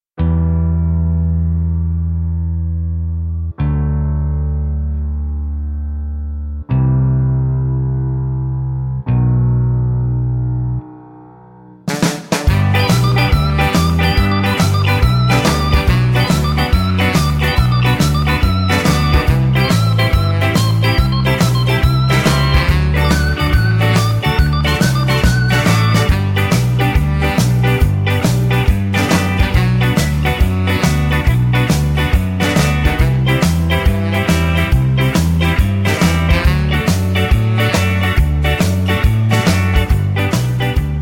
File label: G No Backing Vocals, backing tracks